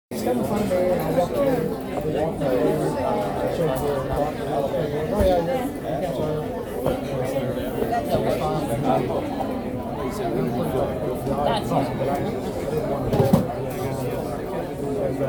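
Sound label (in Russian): переполненный ресторан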